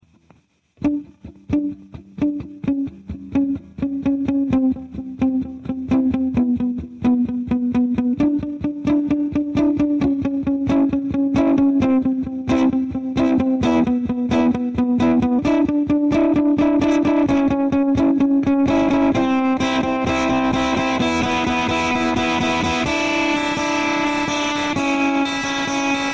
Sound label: Fender mix